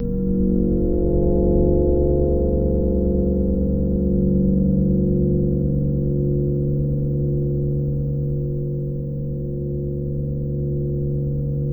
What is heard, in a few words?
FM MOOD; Bolder Sounds - Granular; X-FILE